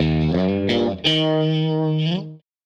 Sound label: Tele Guitar Loop